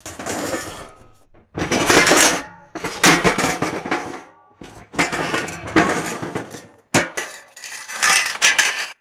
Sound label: metallic objects thrown into debris pile